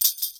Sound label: india hatheli bounce